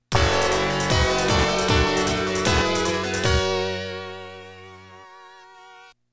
Ship Level Complete; Jim Power The Lost Dimension in 3D; genesis

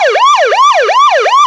Police Siren; car simulator 2

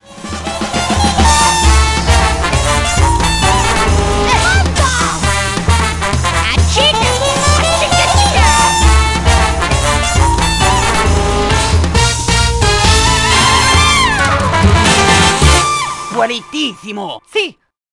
Jazz; Samantha Jazz; Samantha Oups

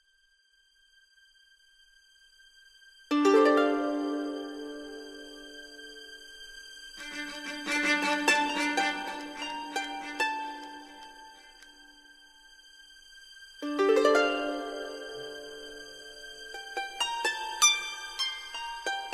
Atmosphere

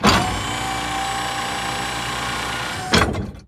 Barrier Move; car simulator 2

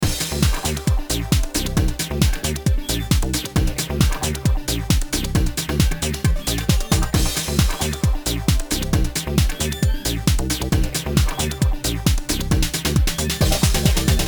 Techno; color cross nds gamerip

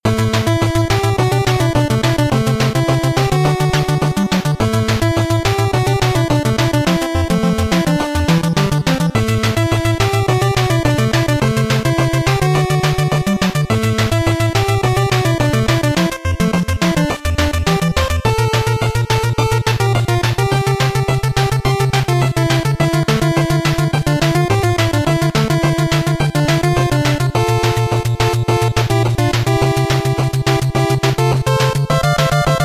BGM LAST BOSS 8bit; Mighty No. 9; 8-bit soundtrack

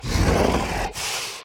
Walk Cycle Burns; The Town

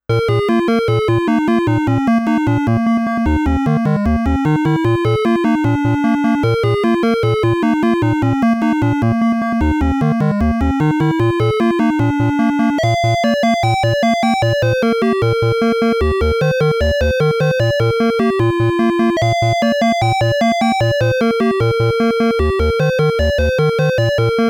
Challenging Stage MUSIC; Namco Classic Collection Vol.2; arcade

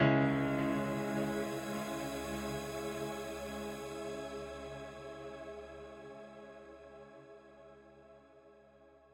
Retry Jingle; Friday Night Funkin' Vs. Dave and Bambi GBC

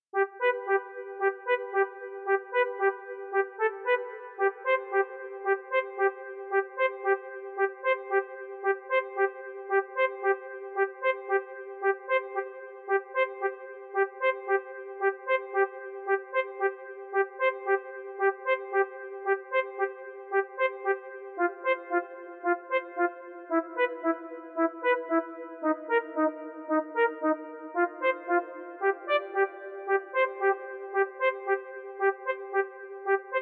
Underwater; Super Mario Bros. 3

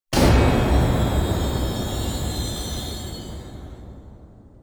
String

